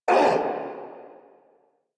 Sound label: Pain Lich A